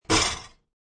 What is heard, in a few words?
Dish Clink, Dishes clanking together.; Kitchen; Household